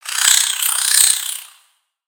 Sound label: Spider Dazed; creatures mechanical; Lego